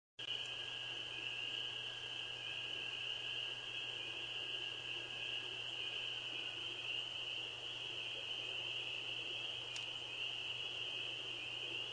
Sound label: Night Sounds